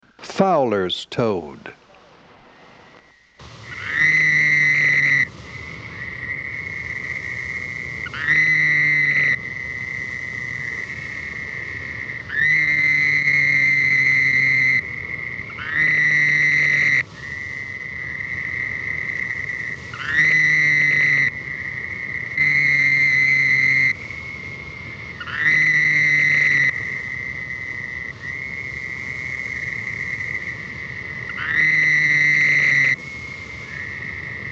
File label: Fowler's toad (Bufo woodhousei); Family Bufonidae - Toads